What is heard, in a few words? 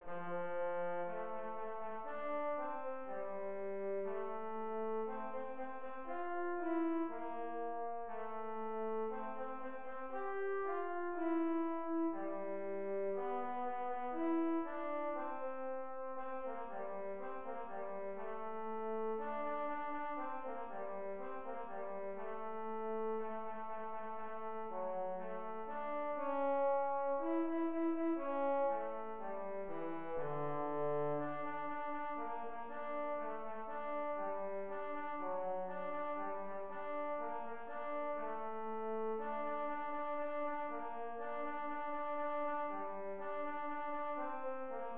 Trombone Excerpt Slow